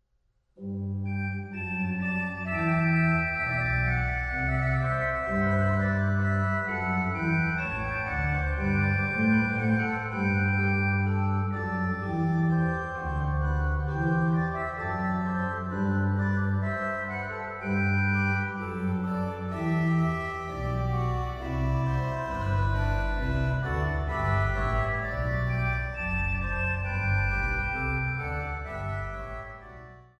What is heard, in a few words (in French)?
orgue; Johann Gottfried Walther; Nun bitten wir den Heiligen Geist; cd orgue 2016